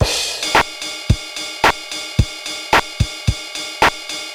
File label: Korg MR-16 (110BPM), beats